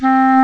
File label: WINDS & BRASS